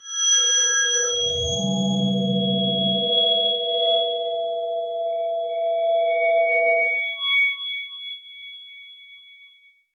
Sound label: metal transformation, glitching; metal transformation with